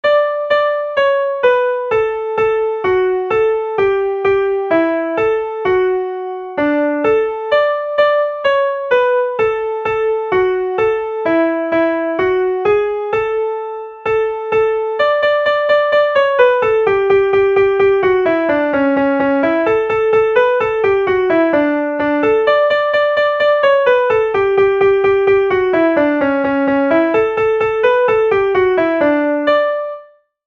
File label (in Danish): sangen musik